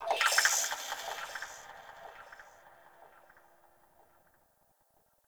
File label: woodpecker; Warframe; Tau